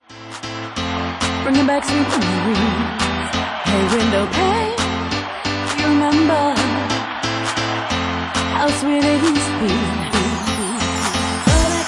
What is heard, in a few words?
Organ 2 Bassline Remix